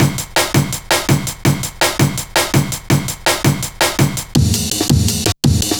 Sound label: Drum Loops, Jungle